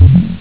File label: blup